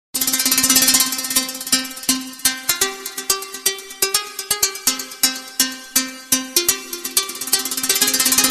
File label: arabic sms